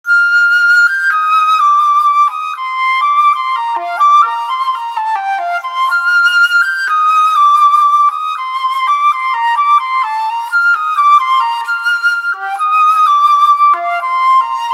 Ring Cantabile; Vertu Constellation T

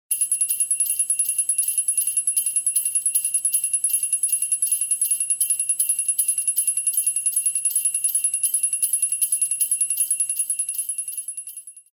Ring The Giving Bell Alarm; HTC; Charity

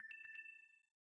Low Battery in call

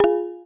ui chord close, slider tones; Motorola ROKR-EM35

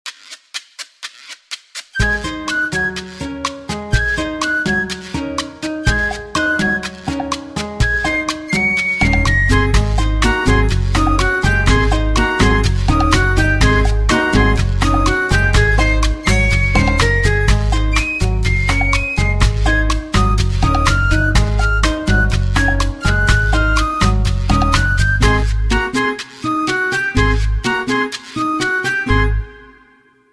Flute & Guitar; LG AN270 Prestige

